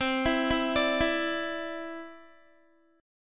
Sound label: C Major Add 9th, Guitar; Cadd9 arpeggiated